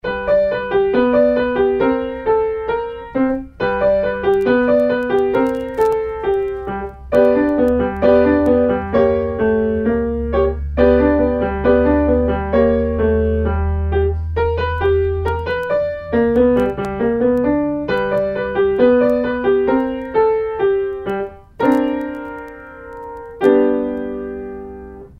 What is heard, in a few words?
swingin beat, piano; Level 1; Bastien